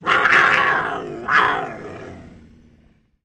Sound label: Puma Cougar Roar Scre, big cats cougars